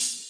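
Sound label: classic hip hop open hi-hat; STYLE KITS; Classic Hip Hop Mega Kit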